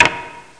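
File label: puzzle snap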